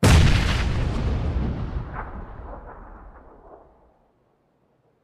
explode

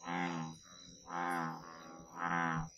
bullfrog

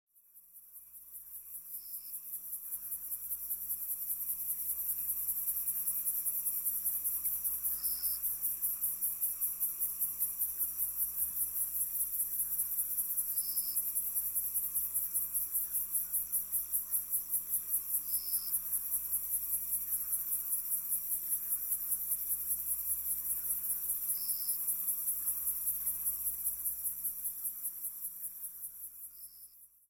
cricket swamp; ambient

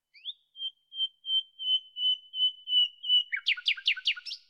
chirping, ambient, bird

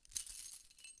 chain, step

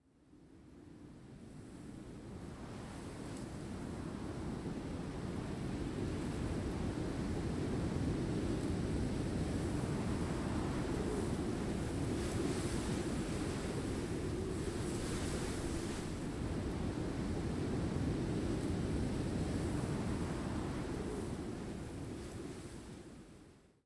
snow wind

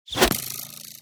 bow hit

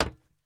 metal box run

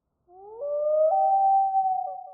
loon; ambient

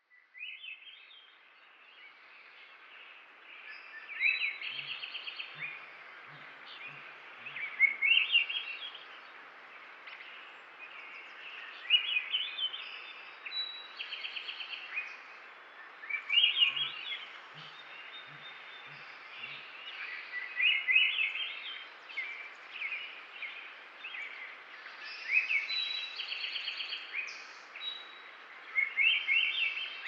long bird, ambient

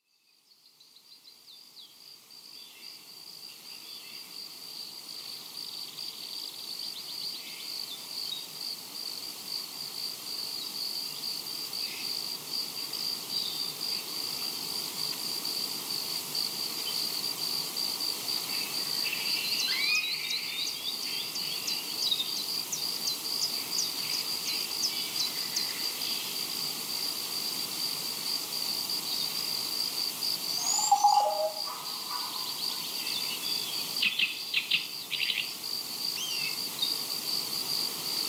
savana, ambient ambience; minecraft; Remastered

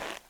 snow wander, step; blocks; Minecraft; Remastered

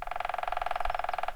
woodpecker

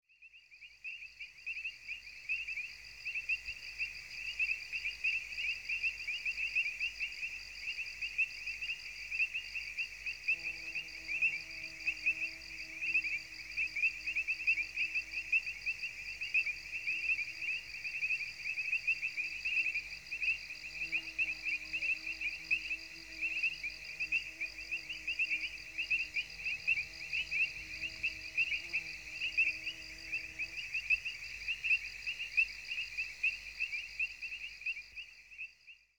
jungle, ambience; ambient; minecraft; Remastered

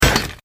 shield block

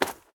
snow run, step; Minecraft; Remastered

